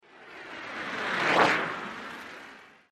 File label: Blow Torch By At Medium Speed, With Lots Of Air Ripping